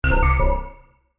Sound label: Computer Tone, Scifi, Computer, Tone, Musical, Synth, Science, Fiction